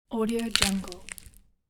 break bone

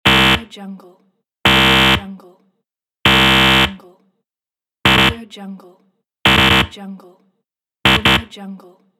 wrong answer buzzer

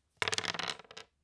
dice movement

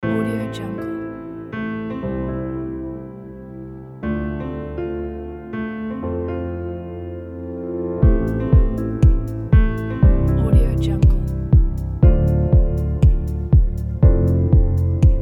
ambient corporate

